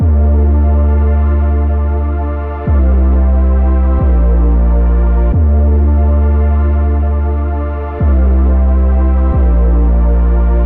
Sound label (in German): akkorde mit 808; 808 Producing Guide